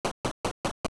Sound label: Stairs; zelda